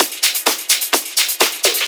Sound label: Discohouse Loop 128 BPM